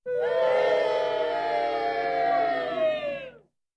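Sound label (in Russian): дети выражают недовольство кричат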